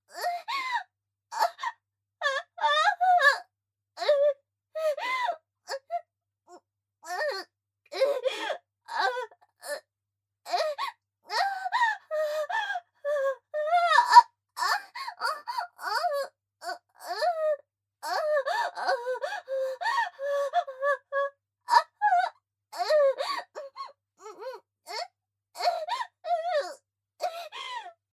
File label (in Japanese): 喘ぎ ゆっくり; A-05 クンニ・喘ぎ・ゆっくり; アスティリア